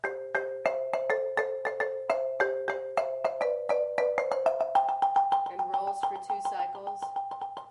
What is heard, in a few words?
lead end singing signal, marimba